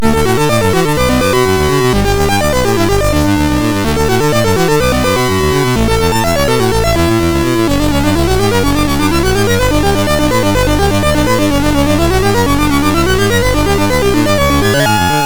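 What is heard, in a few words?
Penetrator, MOS 6581 SID chip music, games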